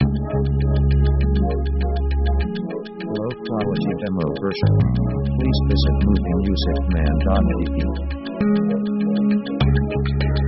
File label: synth space; synth themes